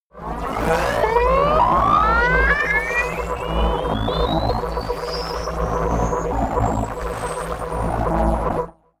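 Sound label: wobble glitch riser long, science fiction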